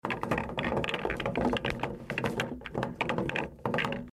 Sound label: balls